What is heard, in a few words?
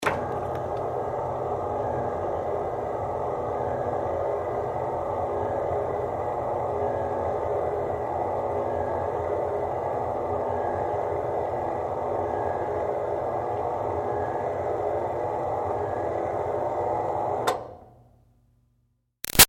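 Projector Screen close up Up Long Steady; Offices; Big Room Sound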